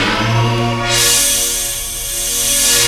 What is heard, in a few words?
Sharp Pad; Jungle; Riffs, Arps & Hits; Blu Mar Ten - Jungle Jungle - 1989 to 1999 Samplepack